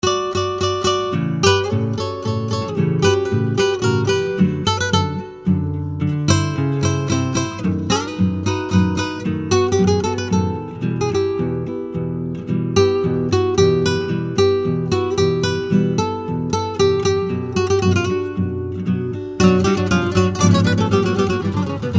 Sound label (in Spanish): en guitarra